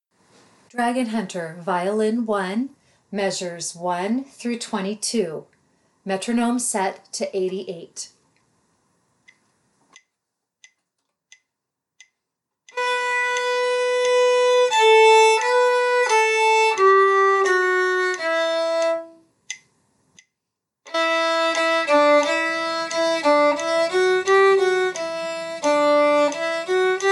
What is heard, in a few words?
violin